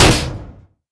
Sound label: sentry upgrading; tf2